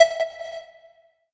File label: countdown; cstrike; trading